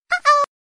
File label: Icq Cuckoo